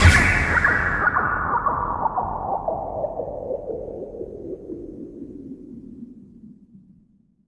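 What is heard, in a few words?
SYN right, Production Effects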